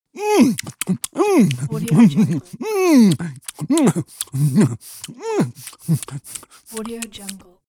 cartoon goofy character eating sounds

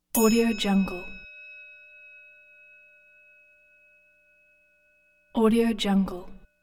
ring bell

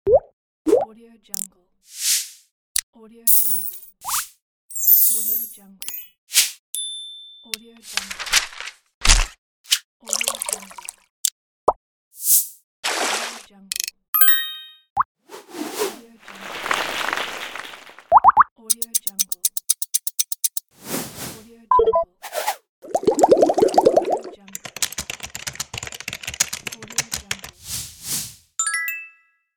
motion graphics sound effects pack

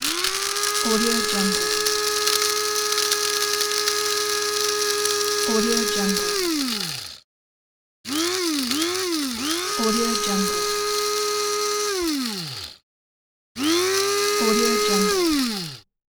coffee grinder

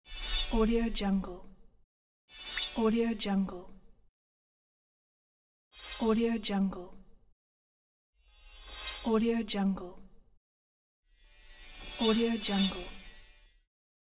bright movement and transition effects

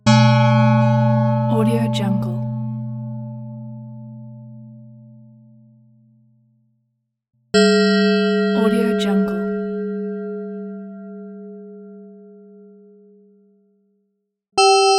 bells

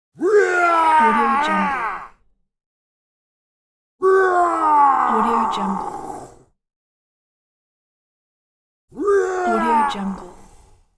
man screaming; moonaudioproduction

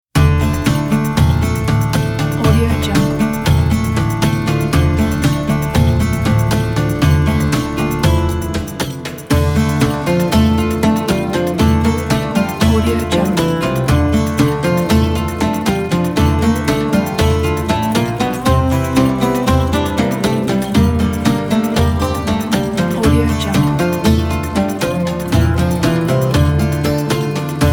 turkish trailer; turkaynisanci

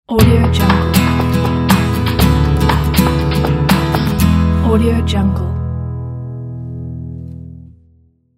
acoustic guitar logo; sparta spo

